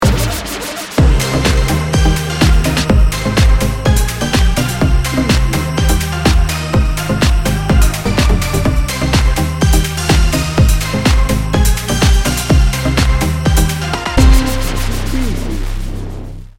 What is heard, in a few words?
dance party edm